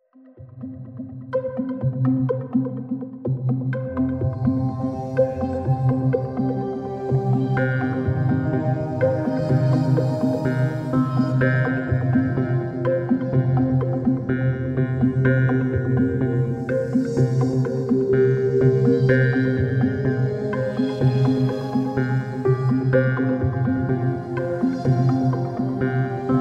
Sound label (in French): AMBIANCE NOMBRE